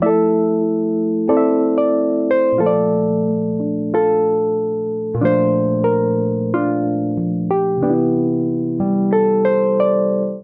Progression Melody & Chords IV - ii - vii - I C Major 92 bpm, Electric Keyboards; Ableton; Factory Packs